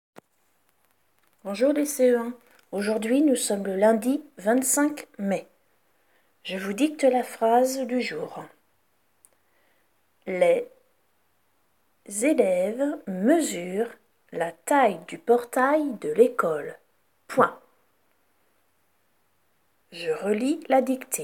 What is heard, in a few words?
Vocal